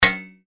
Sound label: gong